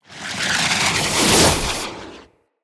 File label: Siege Engine Cannon Projectile By; Weapons; Enemy; Artillery; Ode Siege Engine